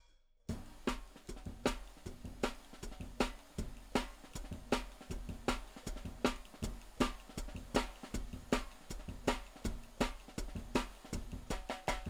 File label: HIHAT, drum n bass; JUNGLE BEATS; THE RAIN RIDE CYMBAL; RAW MULTITRACKS